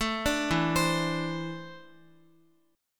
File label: E 7th Suspended 4th Sharp 5th E down Guitar; arpeggiated; 4E,4A,5D,5G,5C,6F